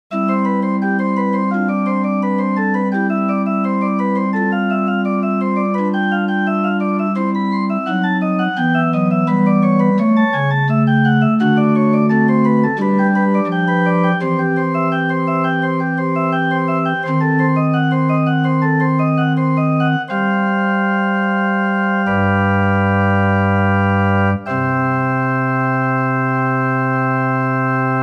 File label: organ; HP-203